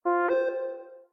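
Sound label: original ringtones and notifications Samsung Galaxy S22 / S22+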